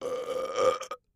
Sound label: Med Belch; belching